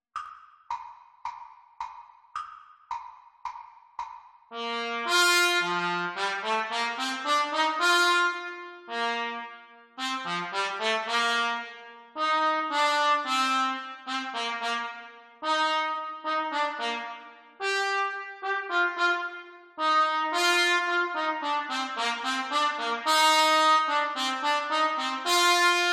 Trumpet Duets; Exam Speed; Grade 4; Cornet Duets

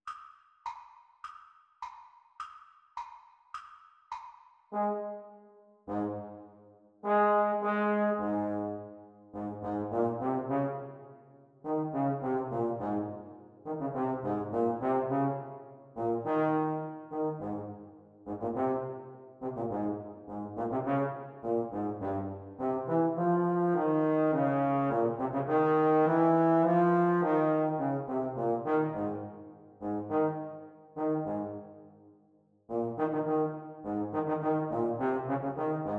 Playalong for Trombone, Speed; Exam Speed

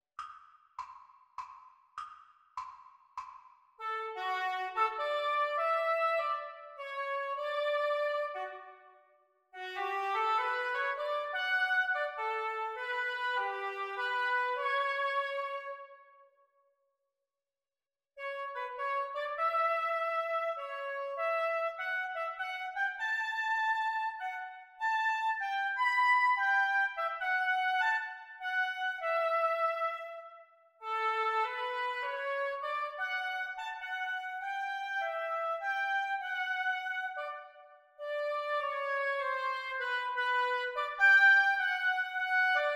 oboe duets practice; practise speed

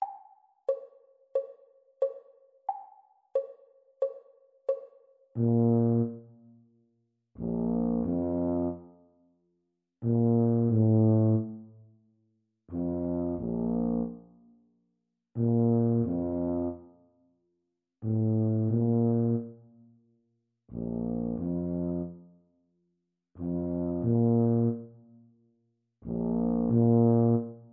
Bb tuba duet exam speed; Grade 1

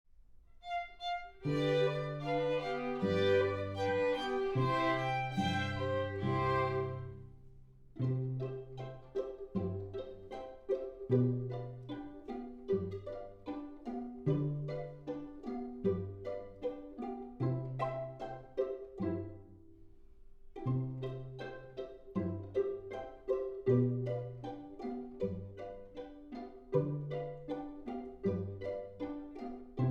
without piano

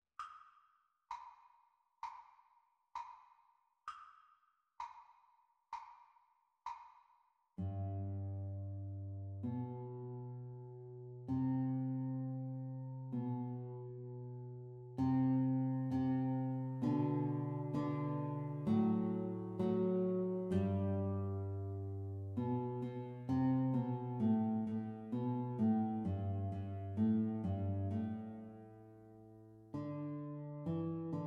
Guitar Duet Playalong Practice Speed; The Teacher part

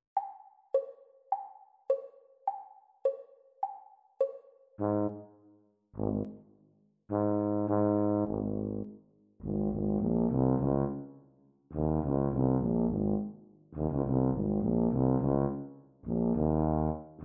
Bb tuba duet exam speed; Grade 5

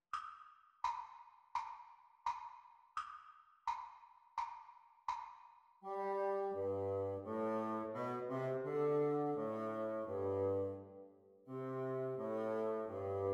Bassoon Duets; exam speed

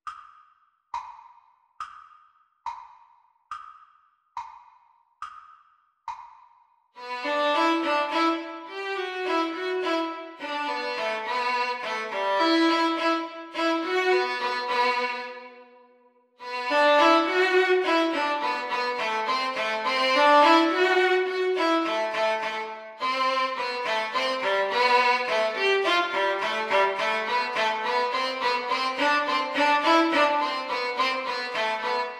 Violin Duet Reel Practice Speed

